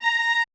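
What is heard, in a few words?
VA Q F#A4-R, viola, orchestra; AKAI Advance Orchestra Upgrade 97 Vol.1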